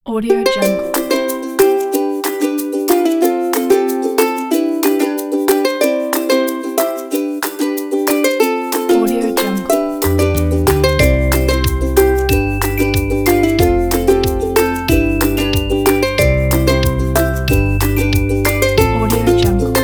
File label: pretty morning